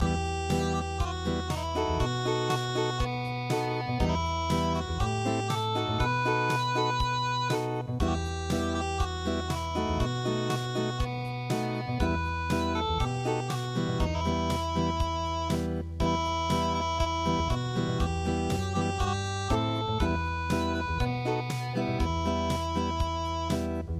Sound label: unpluged